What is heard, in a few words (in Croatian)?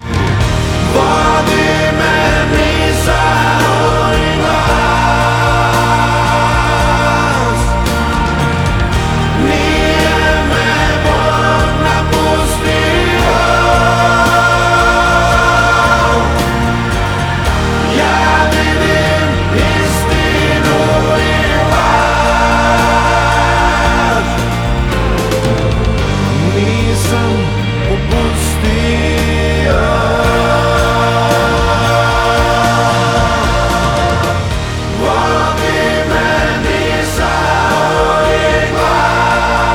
back vokali